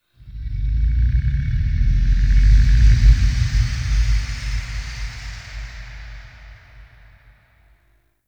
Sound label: Inhale Large; Ambience; Warframe; Sentient Lair